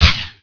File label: spell, insect; Heretic II Demo; monsters